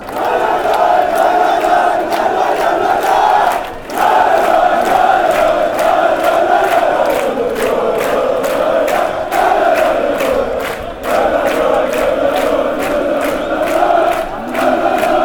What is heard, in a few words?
lay lay lay lay lay, fanchants